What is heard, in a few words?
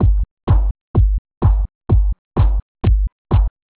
hihat low